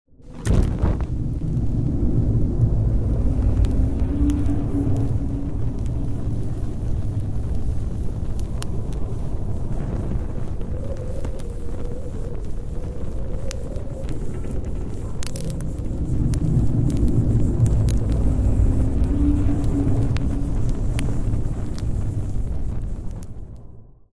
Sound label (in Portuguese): fogo